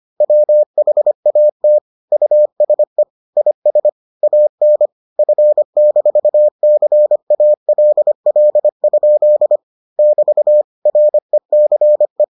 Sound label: morse